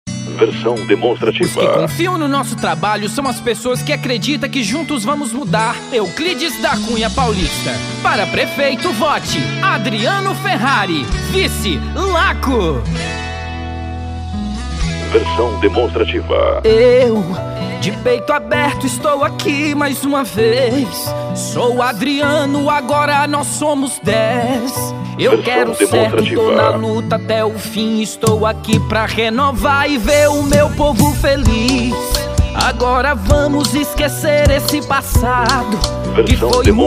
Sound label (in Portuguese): parodia gospel; eleicao 2020; universitario evangelico; para prefeito